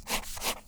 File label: Fine Felt Tip Lines, writing; Books, Paper, Writing